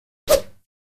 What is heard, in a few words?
Badminton Whoosh